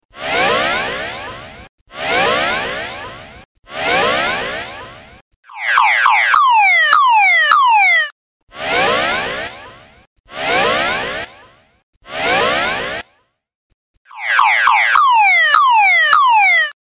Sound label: Best handy alarm